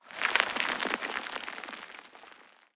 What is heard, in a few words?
Rock Debris Small Short; Boomtown; Titanfall 2